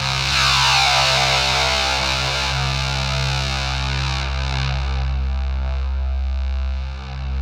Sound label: ZWEEP, Synthy Tonal; Distored Reality